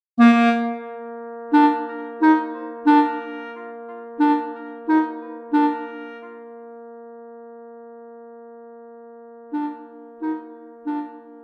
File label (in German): Klarinette